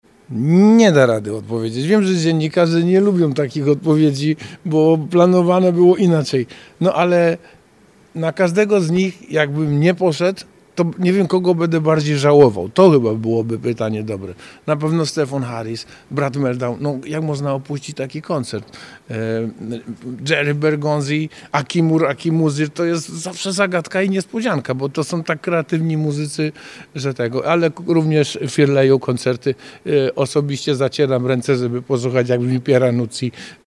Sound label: jazz; 60 edycja festiwalu jazz nad odra